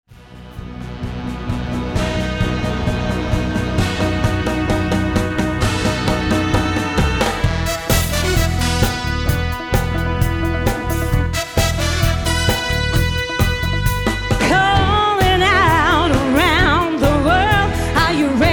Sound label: live mix